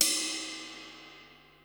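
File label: cymbal ride; 300 Drum Machines; Yamaha Motif ES-Series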